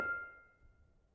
Panerus 6 F, gamelan tuned; gravityharps

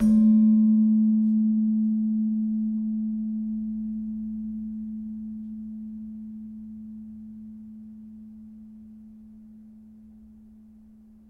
Slentem, gamelan

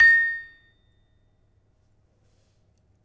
Peking, gamelan

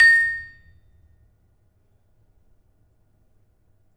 Peking hard, gamelan; gravityharps; timbrebox

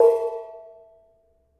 Bonang Barung, gamelan

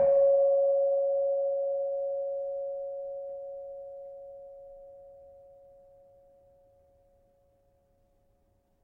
Keno C sharp 5, gravity harps tuned

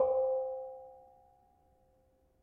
Bonang Barung, gamelan

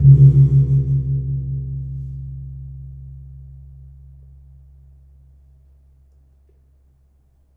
Kempul hard, gamelan; gravityharps; timbrebox

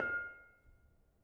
Bonang Panerus, gamelan

